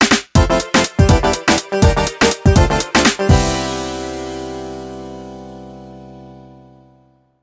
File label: Jingle; Gran Turismo 4